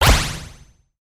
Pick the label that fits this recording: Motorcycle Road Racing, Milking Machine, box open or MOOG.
box open